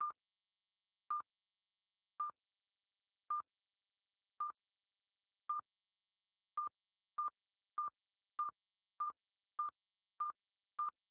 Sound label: Timer; Alcatel; PC-Suite-4.17